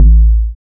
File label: log slide, amapiano log drums